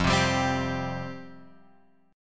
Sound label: F Suspended 2nd F Guitar Open F; strummed; JGuitar